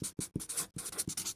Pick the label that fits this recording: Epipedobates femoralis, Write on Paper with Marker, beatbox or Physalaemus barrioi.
Write on Paper with Marker